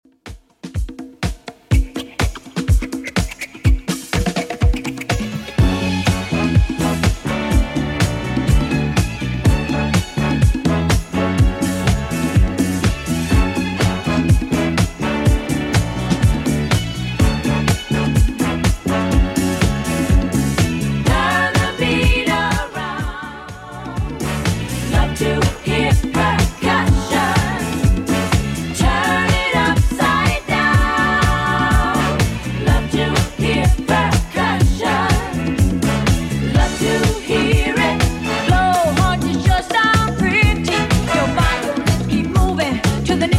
70s Redrum 124bpm

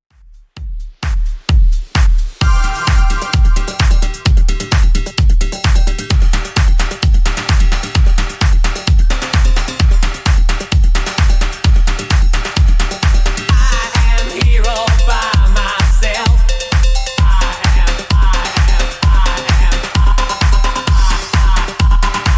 80s Redrum 130bpm; remixplanet

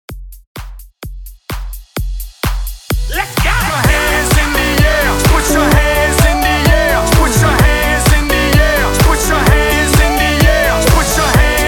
128 BPM

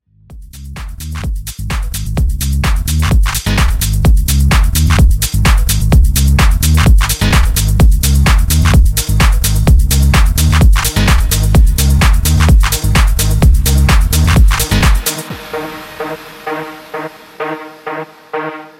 Transition House 128 145 Bpm

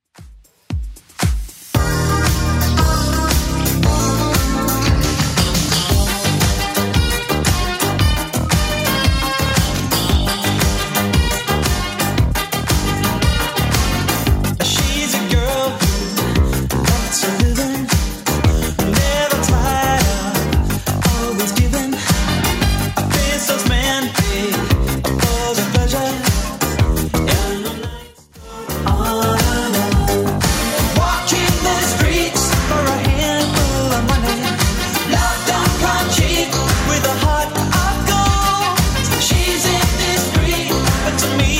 80s Club Edit 115 bpm

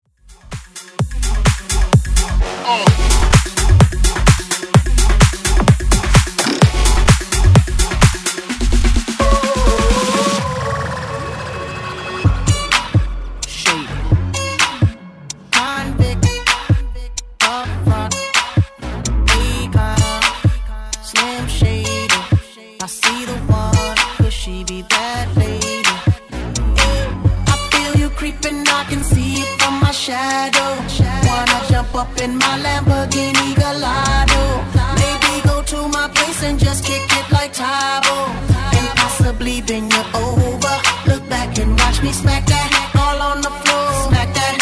Bootleg

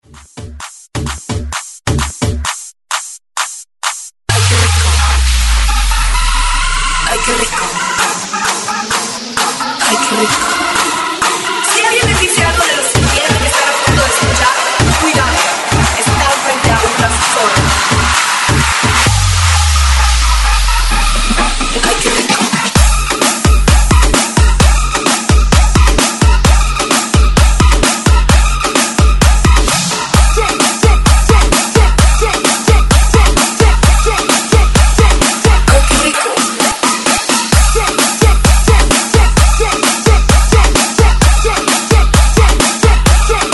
130Bpm BREAKMIX

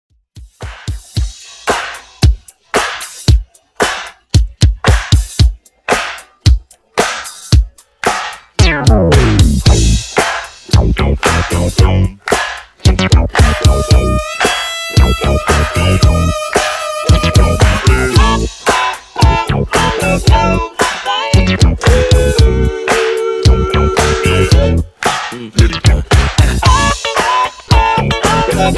Re drum

